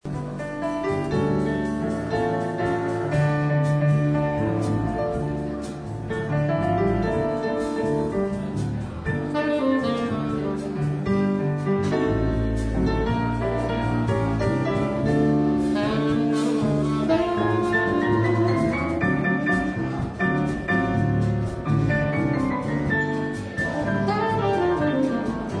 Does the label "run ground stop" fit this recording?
No